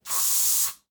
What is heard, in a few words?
Hair Spray